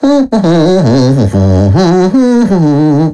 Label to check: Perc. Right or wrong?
Wrong